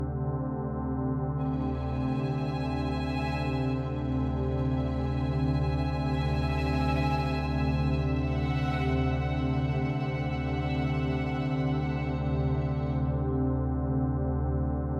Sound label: The Mermaids Womenfolk Sustain Loop; burly men at sea ios macos ps vita ps4 switch windows gamerip 2016